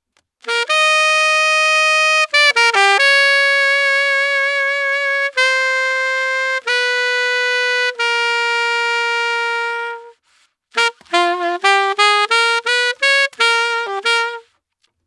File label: alto dolphin